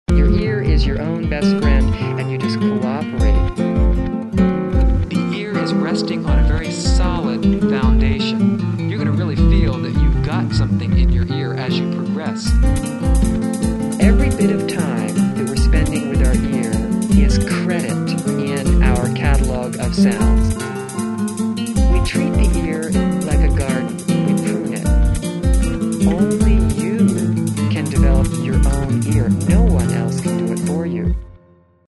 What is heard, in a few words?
duet in f